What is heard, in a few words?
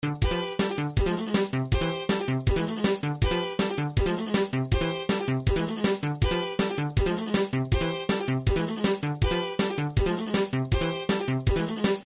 gameboy midi; Gameboy Super Mario Land 2 Bonus Game 2